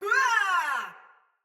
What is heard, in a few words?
Battle Cry Soldier Mace Agent Female; Sinecure Melee